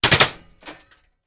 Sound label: Bullet Impacts Metal